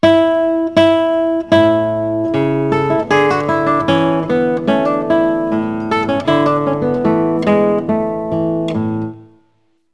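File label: classical guitar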